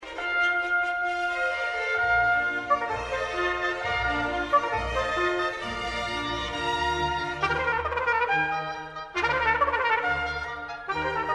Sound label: trumpet concerto e flat major; haydn